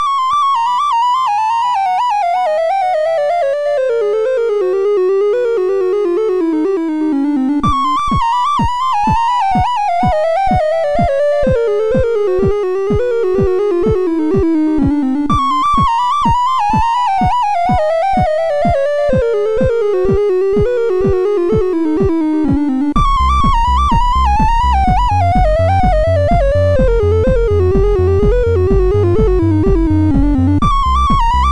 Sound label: C-64 sid MOS6581R4